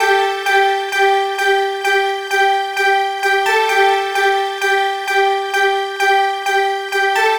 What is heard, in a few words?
NRG Pad